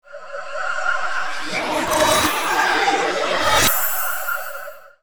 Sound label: peace idle